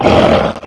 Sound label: nemesis pain; cstrike; zombie plague